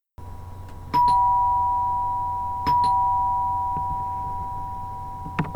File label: ding dong; Airstream